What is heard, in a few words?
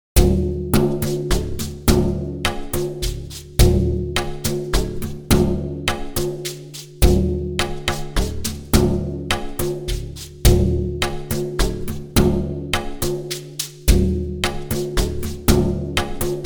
Buffalo Drum; Buffalo Drum RMX